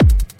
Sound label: punchy clicky techno kick; single hits one shots; kick drum samples